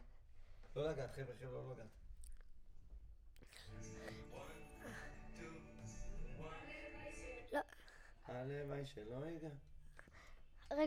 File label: VOC